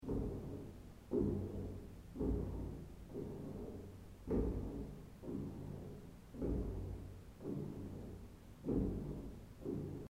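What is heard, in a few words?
souji, cleaning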